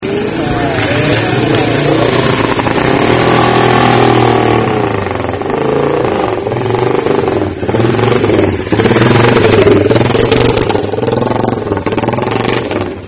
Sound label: 1956 manx norton 500